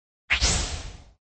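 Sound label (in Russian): мультфильм быстро эвакуируется исчезающим переходом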